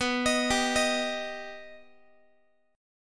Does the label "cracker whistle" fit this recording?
No